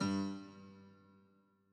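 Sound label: b piano2, note G octave 4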